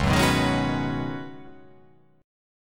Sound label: Db 9th Suspended 4th, Db9sus4 chord; strummed; JGuitar